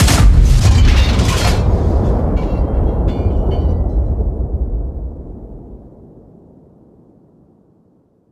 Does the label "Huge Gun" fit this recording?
Yes